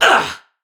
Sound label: Pain; Warframe; Tau; Dax; Ninja